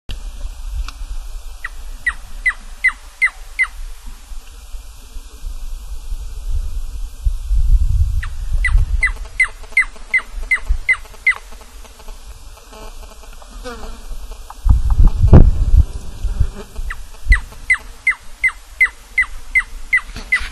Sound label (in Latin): Teledromas fuscus; Las Grutas; Jorge La Grotteria